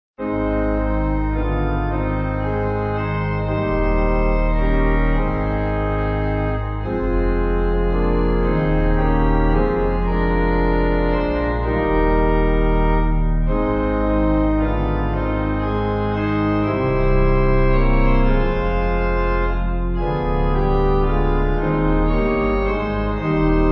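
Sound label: Pipe, Pipe Organ backing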